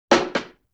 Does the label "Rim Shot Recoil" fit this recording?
Yes